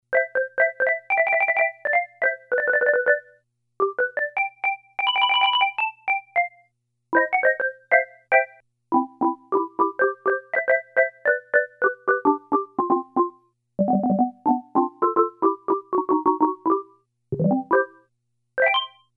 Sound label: Marimba, Casio CSM-1; Tones